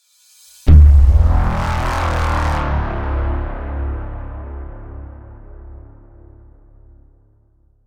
Cinematic braam hit impact

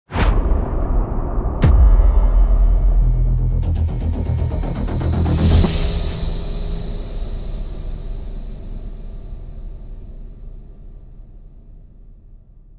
Epic cinematic build swell riser

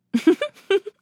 Female chuckles

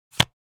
Playing cards poker blackjack dealing single card

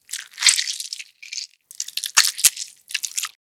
Snake eating devouring prey

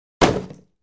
Simple wood hit impact

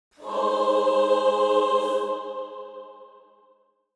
Angelic choir angels video game pause

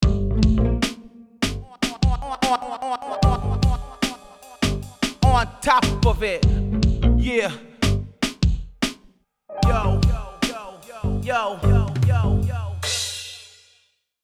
Savage comeback thug life style; social media

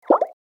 Light bubble pop alert

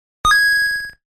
Game coin collect

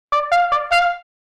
Old vintage cartoon car horn

